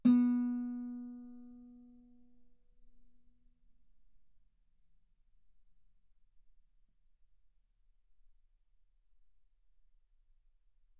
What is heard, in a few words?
Guitar ff sul B B3